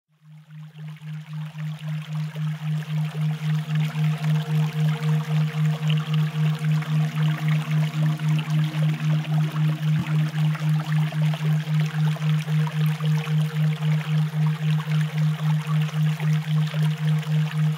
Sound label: Soothing River and Stream Sounds